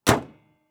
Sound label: Truck GM Envoy Close Hood; transportation